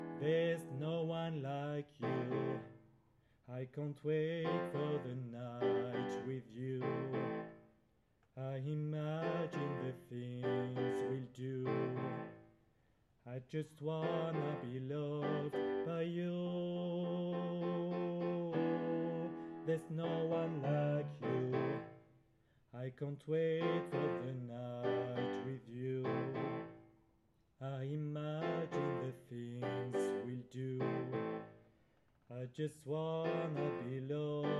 Refrain Alto

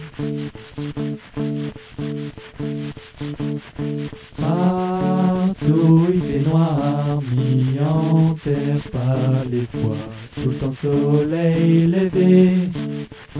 Manger Couplet

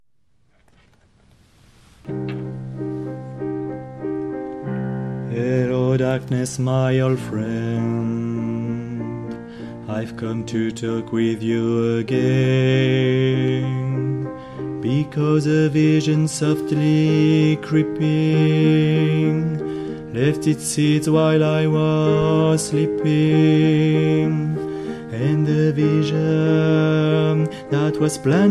Voix 2

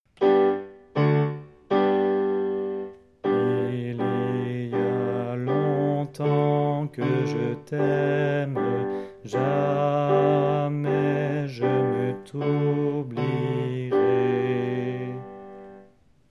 Fontaine Alto

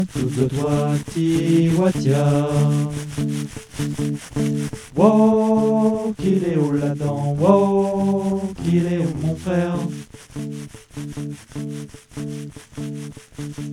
Refrain à 1 puis 2 voix

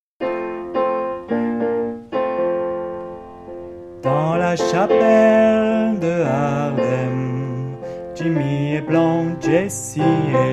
chant playback; la chapelle

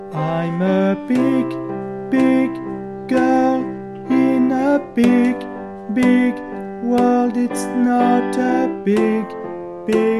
Refrain Soprano

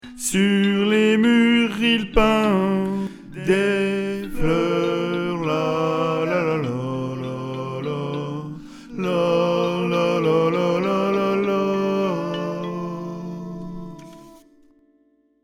berlin ouest coda voix 1

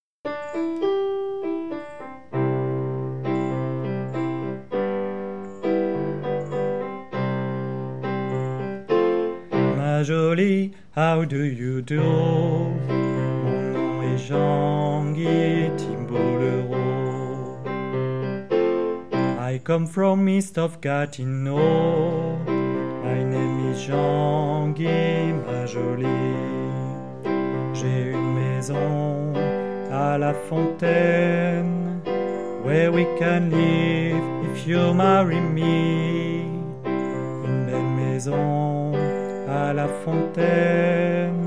sop tempo lent

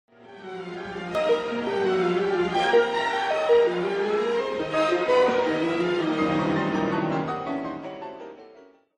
Ondes Martenot clavier

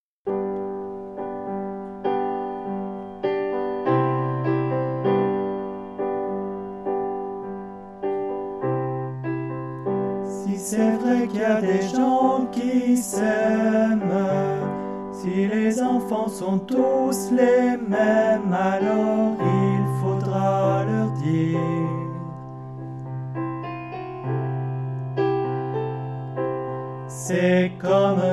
il faudra tutti

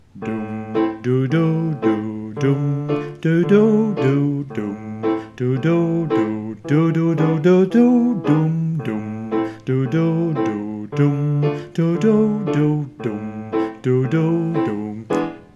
Refrain Basse

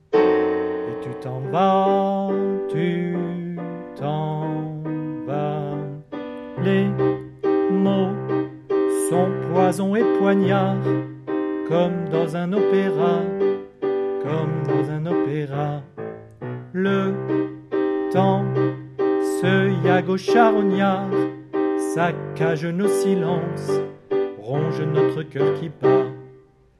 Voix 1

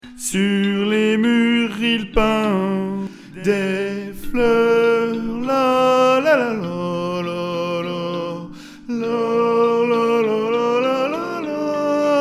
berlin ouest coda voix 2 aigu

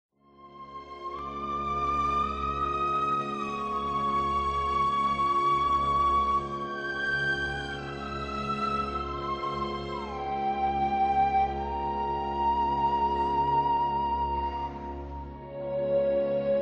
Ondes Martenot soliste

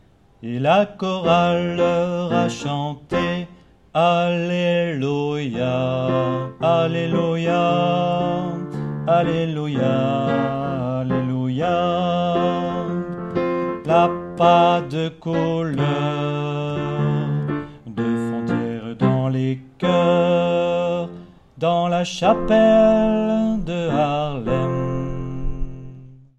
La chapelle Alto

